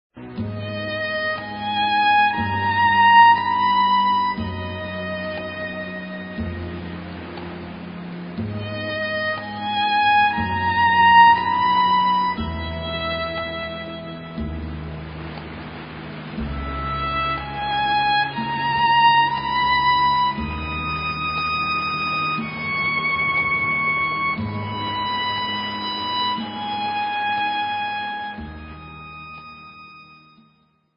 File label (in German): Wassermusik, Meditationsmusik, Entspannungsmusik